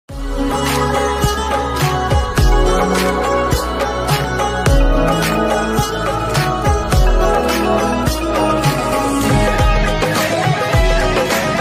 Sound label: ringtone telefilm song instrumental bgm beats